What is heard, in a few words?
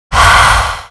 Walk; Seer; GamigoNA